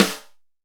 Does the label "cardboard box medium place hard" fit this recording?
No